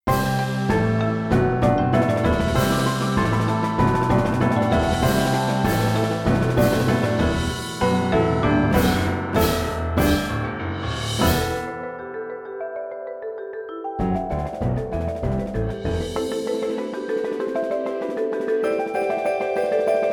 Symphony For Percussion, Marching Band Indoor Percussion and Winds; Part Three; Mockup